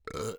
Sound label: burp; OPENAIR; Anechoic